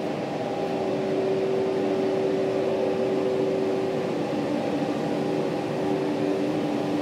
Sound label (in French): Climatisation, TGV; Sud-est; OpenBVE; Train; Orange